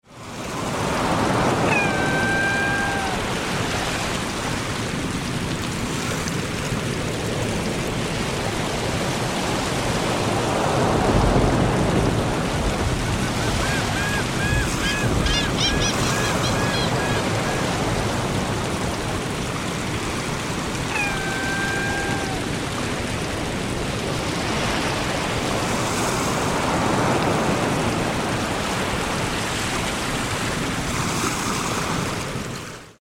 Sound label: Ocean Surf, Soundscapes